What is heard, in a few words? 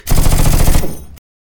rifle automatic